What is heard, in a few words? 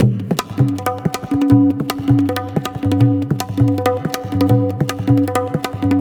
tabla, 160 bpm; Zero-G; Pure Tabla